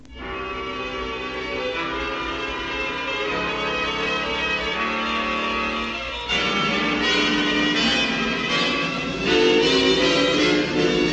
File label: tension